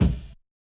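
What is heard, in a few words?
Bass Drum; Yamaha DJX-2B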